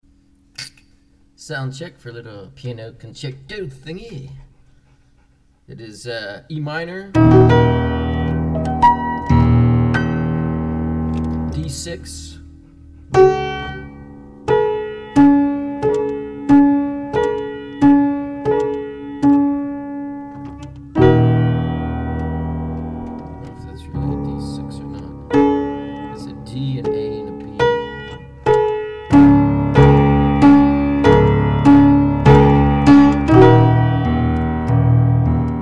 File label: piano concerto Soundcheck